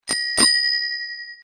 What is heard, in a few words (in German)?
Fahrradklingel Globus